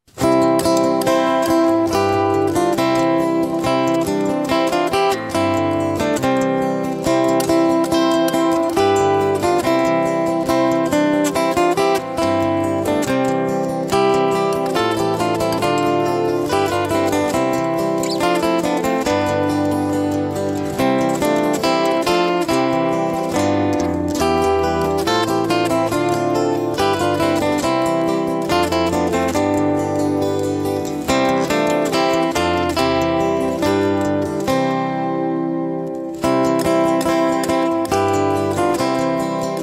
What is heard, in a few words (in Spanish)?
Musica Navidena Instrumental